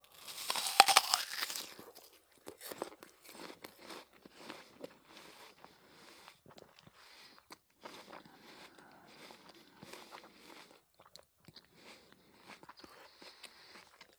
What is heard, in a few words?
Eating Apple, Bite, Enjoying, Chewing, Breath, Semi Close; Soundreorganized; Everyday Actions 1